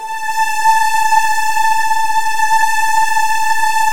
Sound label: A3, Virtuoso Strings; ILIO; Kirk Hunter Virtuoso Strings VOL-1